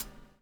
BUBBLE; Spectrasonics - Backbeat

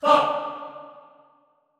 HAH D3, Extended Classical Choir, SHORT HAH; Best Service

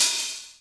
hi hat open, Korg Electribe ER-1; Kit 20

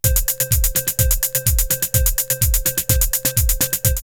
Stomp 126, drumloops Techno House; Cool Stomp; X-Static Goldmine

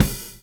groove; AMG Gota Yashiki Groove Activator

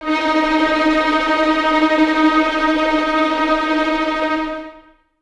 VS D#4 Tremolo, 23 Violins; Miroslav Vitous

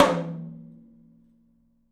open tom; 3D Sound; 3D Drums; 10 TOM

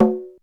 XE8 PERC, drum; Crypton - DrumBase5000

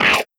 Criket Fx, Cricket

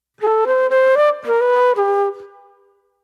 Soul Plate Flute

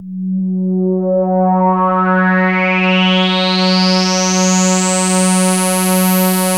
MOOG, BASSES

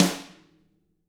tama rim; ILIO; Double Platinum Drums 1

